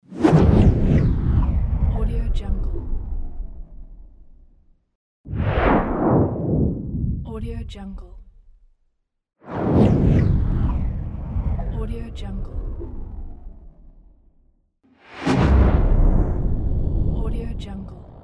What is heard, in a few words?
slow motion; tibasound